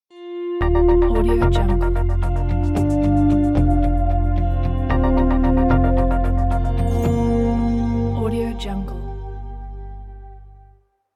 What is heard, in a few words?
melody moves downward logo